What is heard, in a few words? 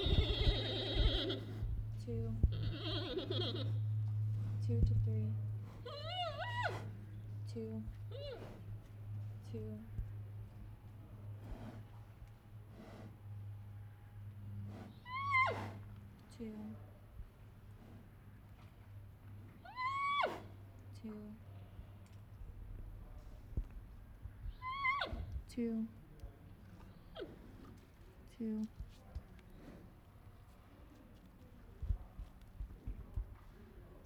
Chirp